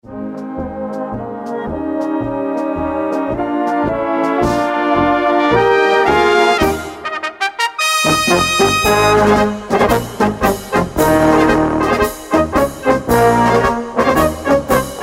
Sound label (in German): Blaskapelle